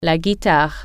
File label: guitar